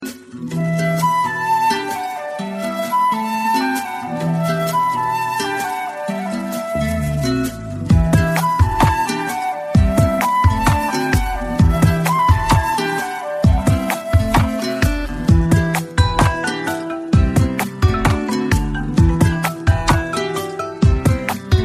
latin flute; ringtones; latino

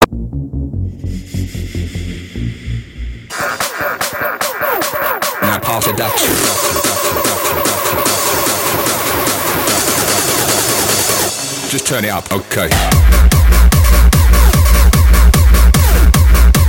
techno; moto 2010

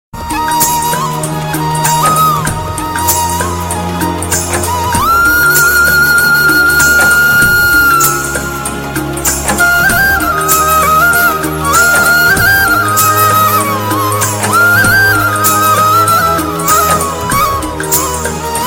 Flute

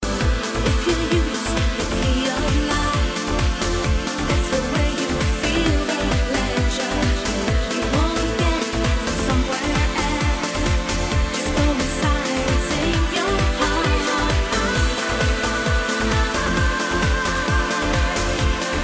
trance, ringtones; electronic

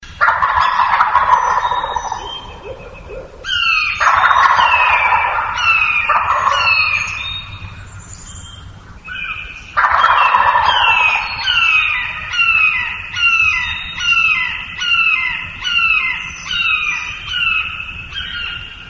turkey hawk; animals

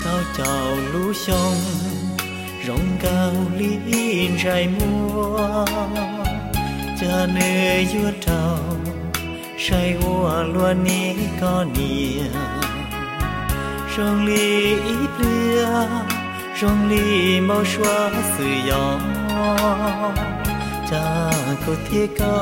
soul

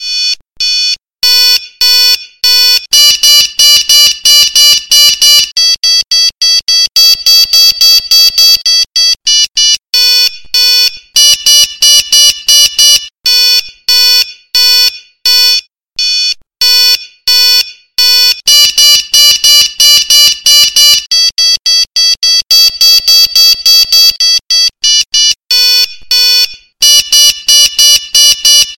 Loudest 3D Alarm

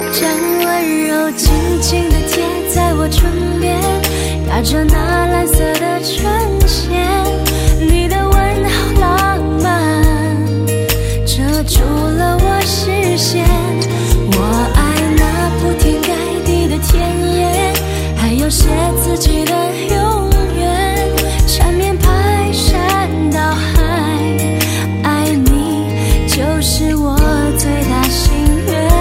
khihihi

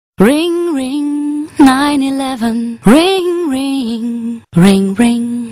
Pager Tone

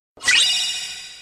Item Get Sound

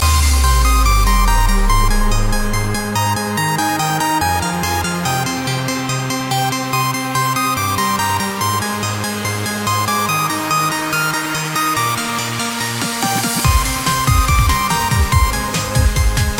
bass

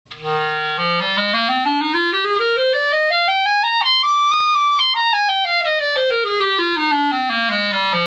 Clarinet

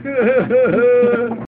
Laugh Medium

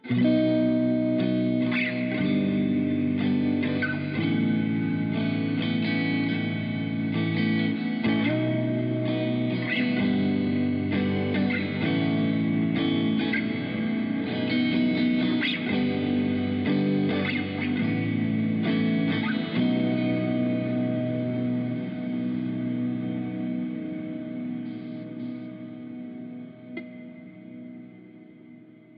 CALM GUITAR

